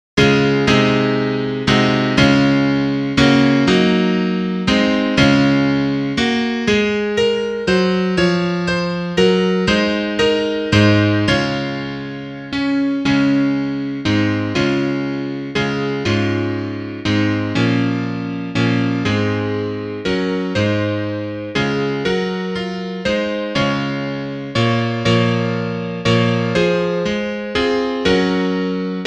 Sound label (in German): Klavier Solo, trompete; Nun jauchzt dem Herren, alle Welt; (Duett)